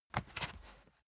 step barefoot wood plank scuff; Footsteps; schritte

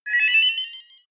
game start; signale

